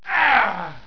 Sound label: fall; ratamahatta